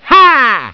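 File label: shoot grenade; luigi; supermariobros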